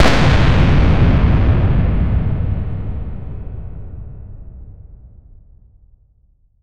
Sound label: powerful explosion